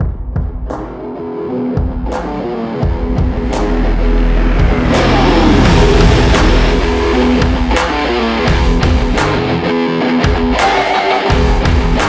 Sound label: Jumping Ball; An energetic stomping rock song is perfect for sports, workout, fight or racing visuals.